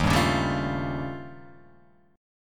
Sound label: Eb 9th Flat 5th, Guitar Open F; Eb9b5; strummed; JGuitar